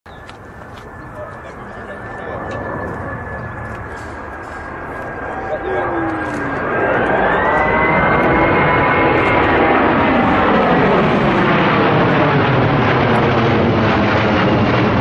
Airbus Beluga

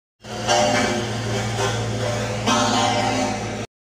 Road roller

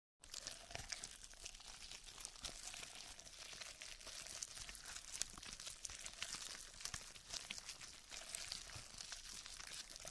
Earthworm; کرم خاکی

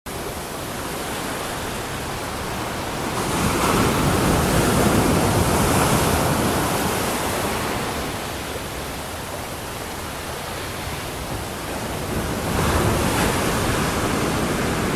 Gorgeous Beach Day

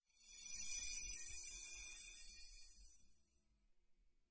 Particles

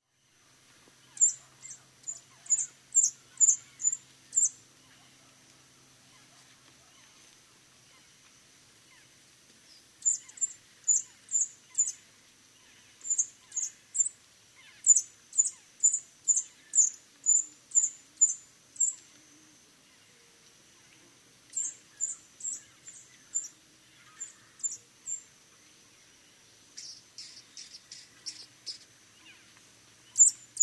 Rufous throated sapphire; پرنده یاقوتی گلوحنایی

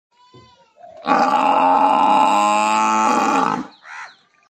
Domestic water buffalo

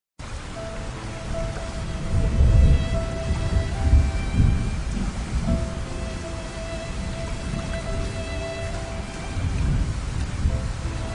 Rain and violin

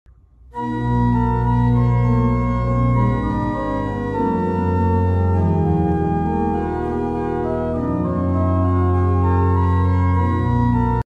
organ instrument

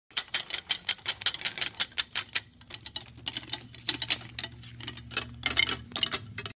Wooden containers; ظروف چوبی

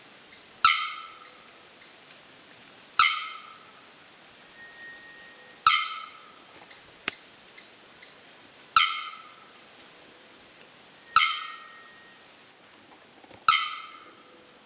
bearded bellbird

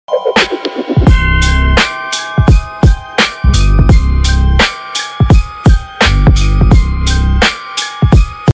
hip hop; موسیقی هیپ هاپ بی کلام

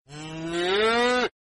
Calf

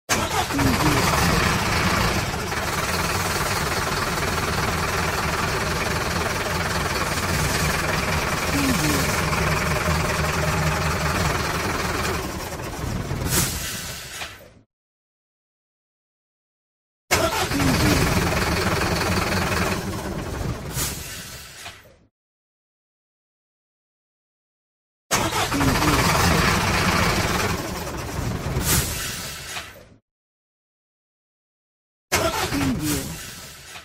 Old Truck Engine Start Run And Stop

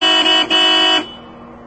Hella, صدای بوق بنزی; بوق بنزی هلا

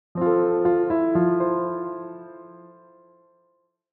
soft piano logo; پیانو

